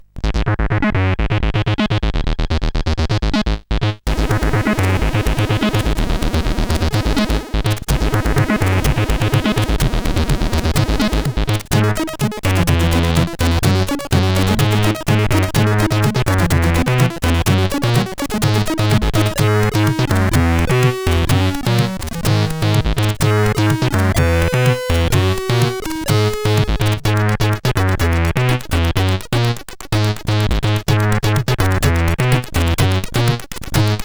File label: note sid CSG8580R5; Scrap Iron